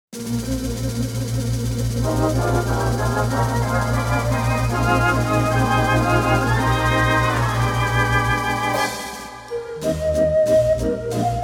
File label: Theatre Organ